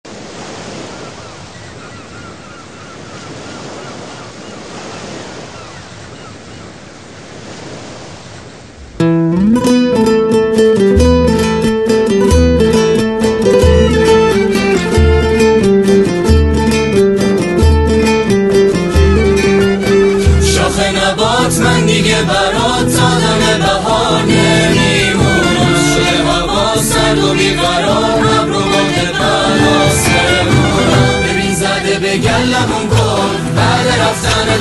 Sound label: Guitar